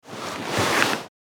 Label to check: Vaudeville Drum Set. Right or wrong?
Wrong